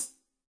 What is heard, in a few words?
classic hh Flc; STYLE KITS (Hip Hop, Etc); Classic Hip Hop Mega Kit